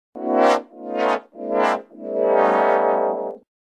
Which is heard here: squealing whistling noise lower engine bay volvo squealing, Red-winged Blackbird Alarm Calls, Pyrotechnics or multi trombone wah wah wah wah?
multi trombone wah wah wah wah